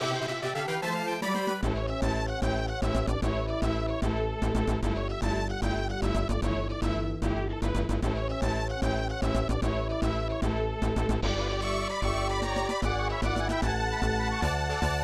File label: Victory Song; game ost; breath of fire